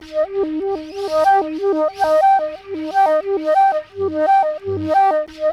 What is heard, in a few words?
FLUTE FX; Greytsounds - Philip Wolfe David Crozier's Saxophones